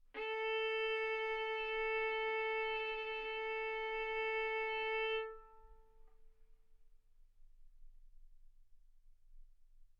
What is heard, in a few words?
Violin arco mf sul A A4 B4 stereo; single mic